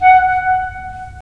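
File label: wind clarinet; LA Composer vol. 3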